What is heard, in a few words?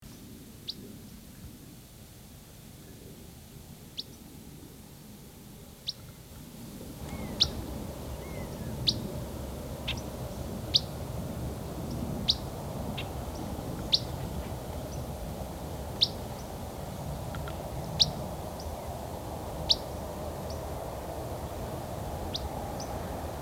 Empid